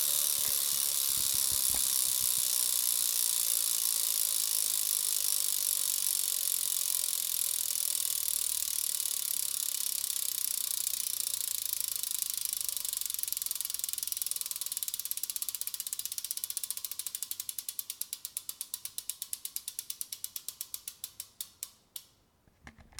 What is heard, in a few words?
Bike